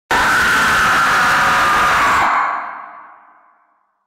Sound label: terrifying scream sound effect scream scary sounds